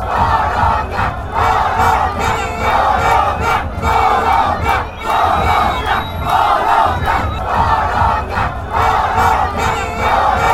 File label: colombia fanchants